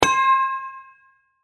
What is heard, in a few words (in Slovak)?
jingle dama; inlogic-checkerslegend